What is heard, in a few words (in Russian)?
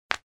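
костяшки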